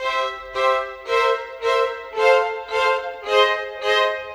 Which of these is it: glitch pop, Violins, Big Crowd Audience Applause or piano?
Violins